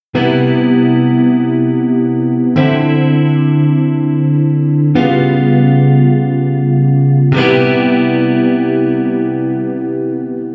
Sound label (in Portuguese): Cadencia C7M Dm79 Db711 C7M